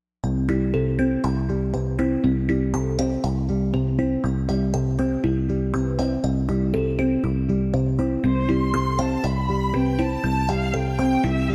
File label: Corporate Vignettes